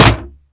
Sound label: wood impact, Bullet Impact; Dystopia; physics